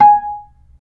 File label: B-3 Percussion; Roland; Keyboards of The 60's and 70's